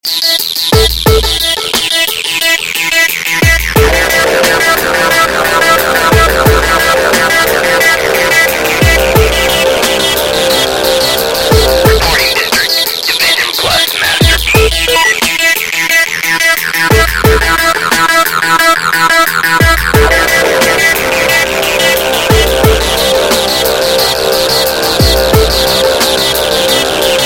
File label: Hard Techno